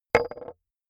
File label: metal impact wood light